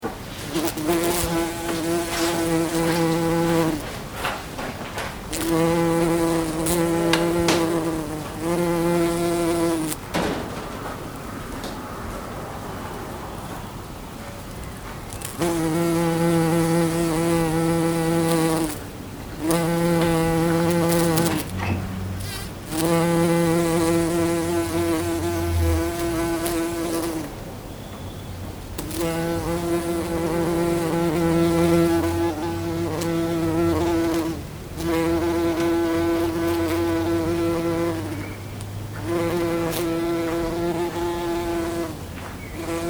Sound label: hummel bee